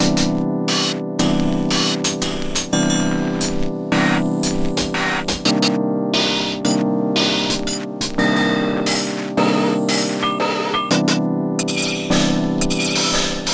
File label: e vinyl hihat